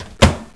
close, porte

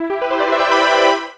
happy chord

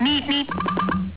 meep meep

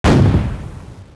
tank fire

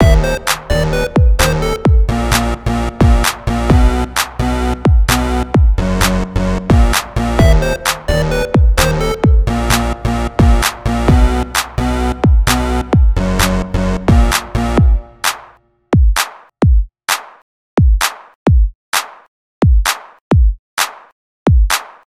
Crunk; CivicTronic; LMMS

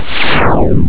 ufo shot

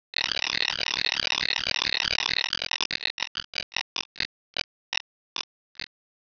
roulette

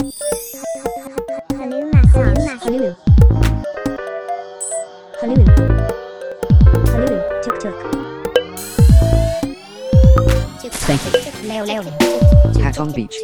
Menu

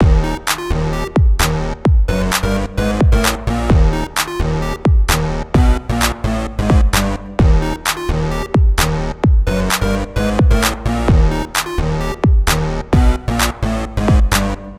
Alternative Crunk; LMMS

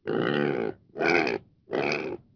pig

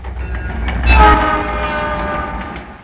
train pass fast

